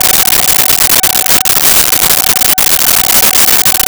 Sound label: Car Chirp Loop; Automobiles